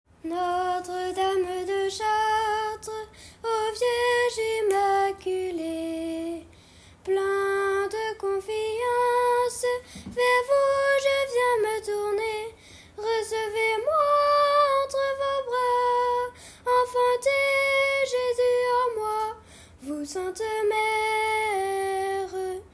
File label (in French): Chant du Jubile Refrain Soprane; sanctuaire